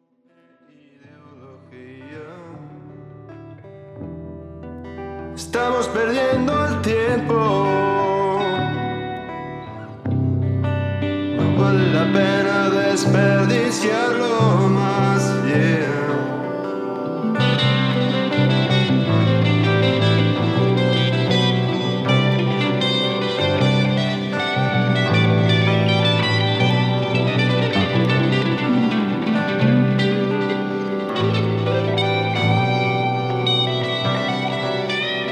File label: Rock ballad unmastered